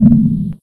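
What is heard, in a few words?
powerball bounce; minetest; portalgun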